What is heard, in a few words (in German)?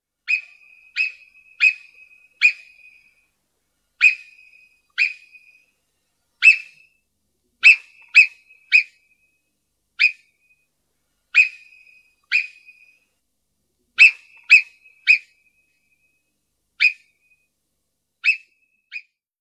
Murmeltier Marmota marmota Warnpfiffe